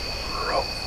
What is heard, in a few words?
rope